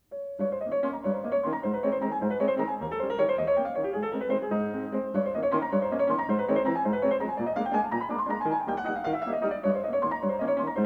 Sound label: Waltz in A flat major, op. post., Piano; Chopin; Waltzes